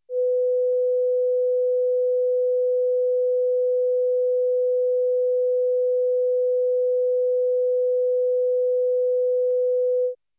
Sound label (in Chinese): 讯号测试 500Hz